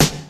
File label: rap steel snare drum sample D# key, Royality free snare sample tuned to the D# note.